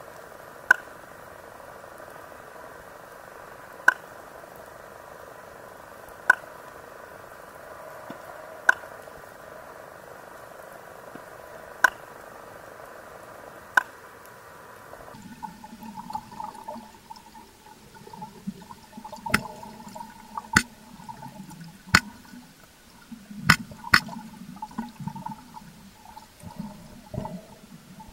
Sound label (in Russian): skat